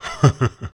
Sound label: laugh